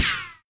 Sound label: smg fire